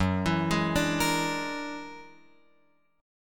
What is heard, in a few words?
F sharp Augmented 9th, Guitar, Open F; arpeggiated; x,1,4,3,5,5; JGuitar